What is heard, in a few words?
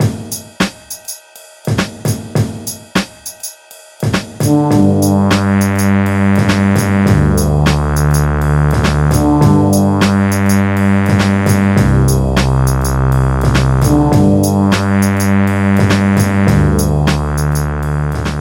sweep